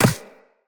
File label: Walk Ice, Footsteps; Operator